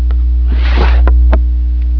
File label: Open